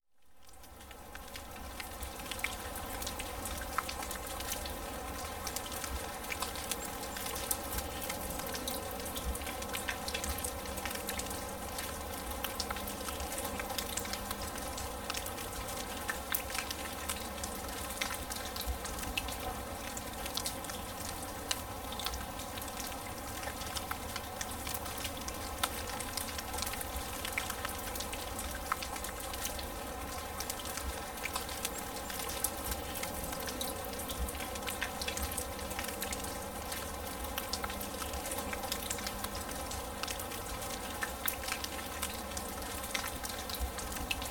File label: smooth rain on grass